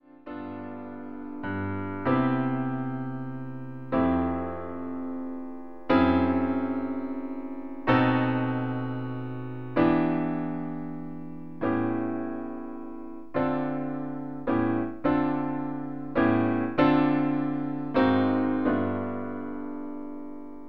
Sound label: chords piano